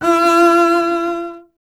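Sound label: string contrabass E; Miroslav Vitous; Solo Instruments VOL-2; Solo 2 Bank 1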